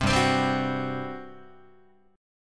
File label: G Major 7th Suspended 4th, Guitar; GM7sus4 strummed; JGuitar